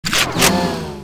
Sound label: cart first draw, weapons